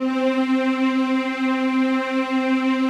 PAD, DIGI-PAD

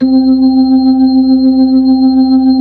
B-3 Chorus

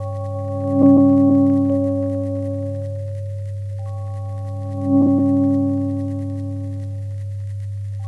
Minimal, Synth Sequences

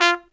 T F Q, TRUMPET FX; Zero-G; Phantom Horns

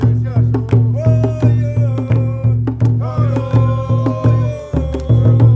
RAINDANCE; Spectrasonics; Heart of Africa 2

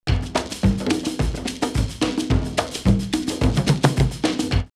Kano 108, drumloops World & Ethnic; X-Static Goldmine

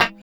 G 3 A funk chord, guitars; Spectrasonics; Hans Zimmer Guitars VOL-1; 90's Sample CDs

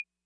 Clava, 808

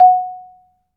MARIMBA; ILIO

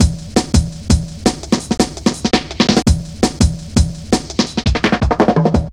Drum Loops; Zero-G Jungle Warfare 1, 2 & 3

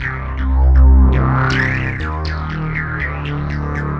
Round About Bass, Bass Foundations; Sonic Foundry (Sony Creative Software); The Electronic Music Manuscript Collection

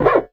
FRET, FINGER MUTE; Xsample VOL-1

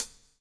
COPS STK; Spectrasonics - Backbeat

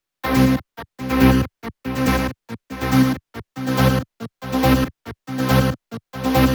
140BPM C; Vengeance - Essential Clubsounds Vol.4

